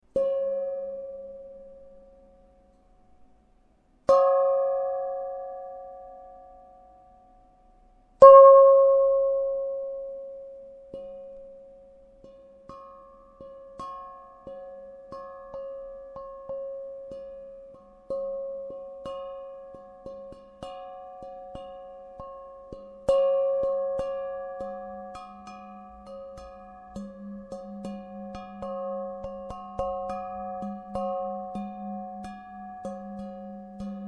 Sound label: gong